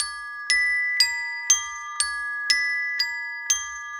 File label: Bells, Orchestral, Rock Pop; Sonic Foundry (Sony Creative Software); Orchestral Series VOL-4 - Rock and Pop